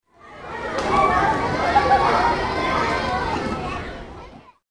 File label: kids, residential; zones